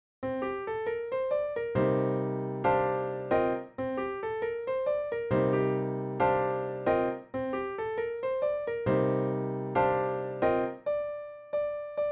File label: C Dorian Cm7